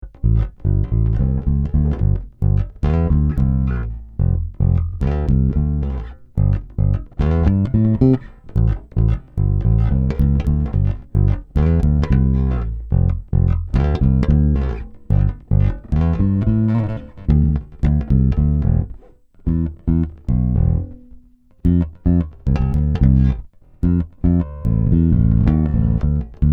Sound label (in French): M Passif Tone 100, basses, Sadowsky NYC 5